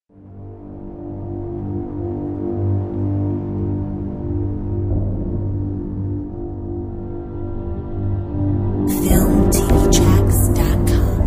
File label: sweeping bass